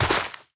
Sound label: step, footsteps player grass